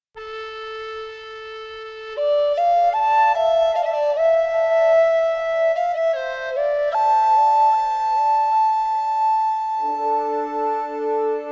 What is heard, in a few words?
Exploration; The Witcher 3 Wild Hunt; Skellige